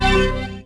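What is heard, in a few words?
Hit Succeed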